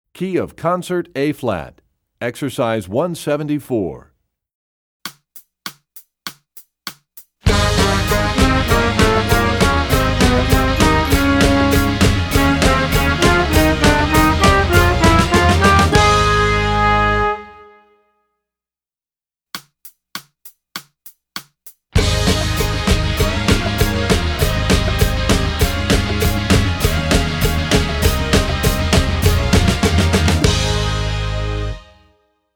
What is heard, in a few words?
Studies Concert Ab; EE2000 Book2; Rubank